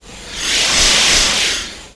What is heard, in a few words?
impact double bolt; Earth and Beyond; EA Games